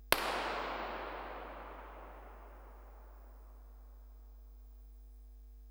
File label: Pistol Bin; Bergamo; IMP-RESP